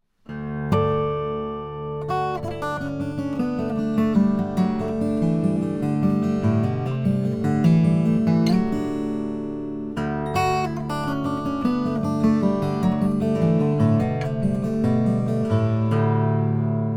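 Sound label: lowden honduran rosewood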